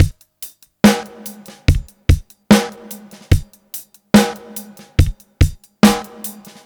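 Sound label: 72 bpm, beat loops; Zero-G; Monster Beats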